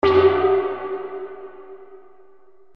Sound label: crystal; meepo; chorus